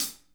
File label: HAT CL, drum kits